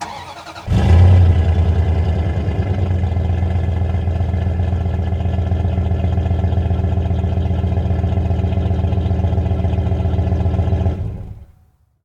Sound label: Truck Durango Start Idle Off; Trucks